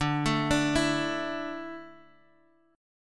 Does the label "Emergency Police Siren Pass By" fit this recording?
No